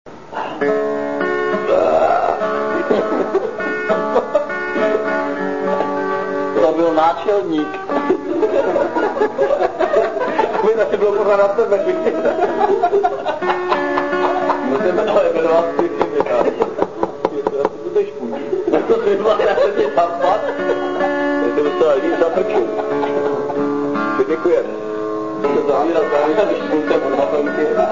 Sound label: English song